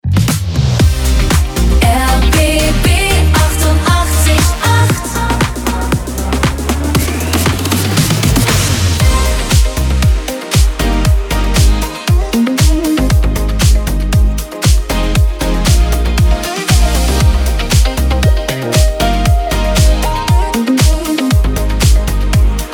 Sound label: Daytime Opener